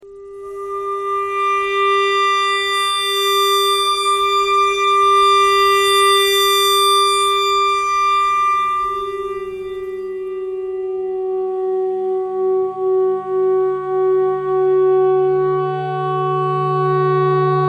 clarinet notes e clarinet thread noise

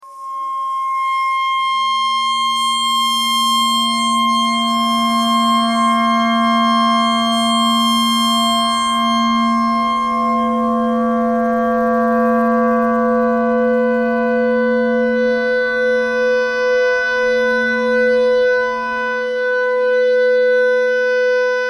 clarinet clarinet notes e noise

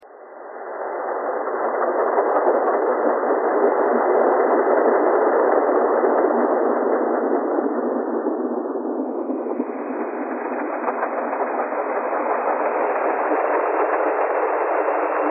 train thread all

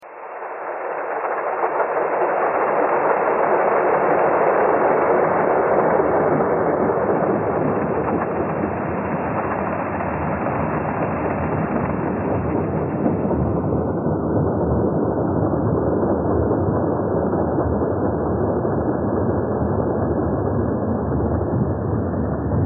thread harmonic

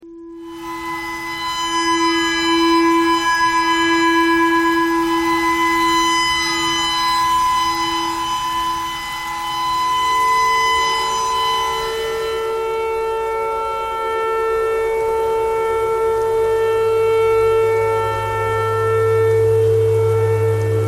tornado siren clarinet thread noise

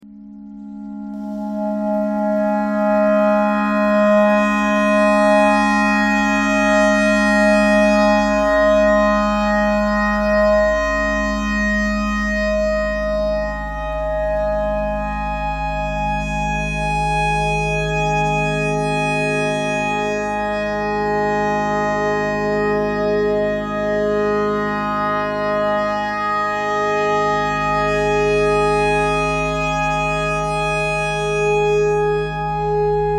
clarinet notes e clarinet simple all

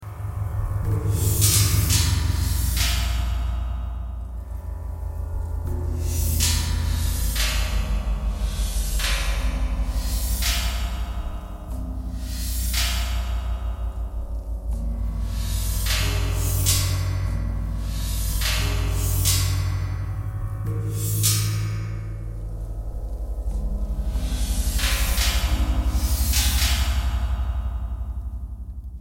knocking thread noise all

